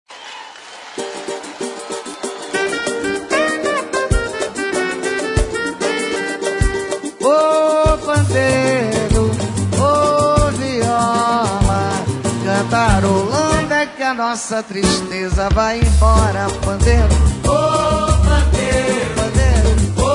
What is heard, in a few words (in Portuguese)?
Pandeiro E Viola, Ao Vivo